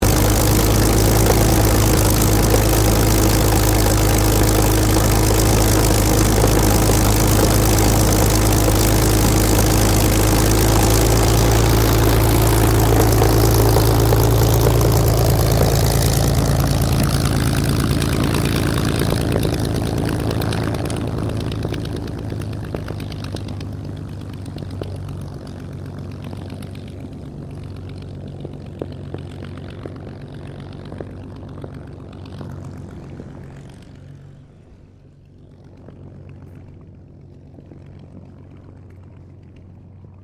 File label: AIRCRAFT SUPERMARINE SPITFIRE MK-VIII TAXI STEREO; Airplanes